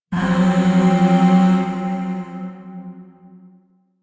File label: Zariman Void Shawzin Hirajoshi Chord